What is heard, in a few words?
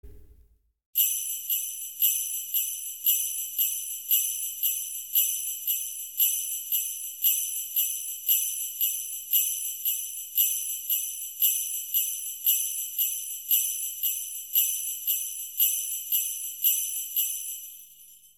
Christmas Jingles